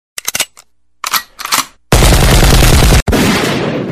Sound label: Rapid Gun Shot